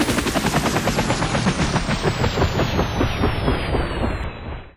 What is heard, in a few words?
heli stop, toys; CosmicRage